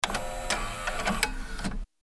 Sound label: dvd door open softly; hollywoodedge